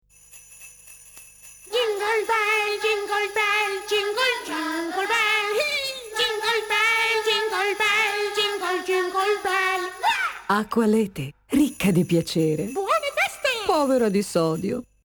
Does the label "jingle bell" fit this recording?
Yes